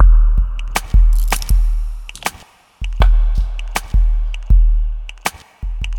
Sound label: Crawler, ambient; ambient vol 2